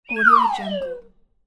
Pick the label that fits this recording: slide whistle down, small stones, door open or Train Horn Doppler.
slide whistle down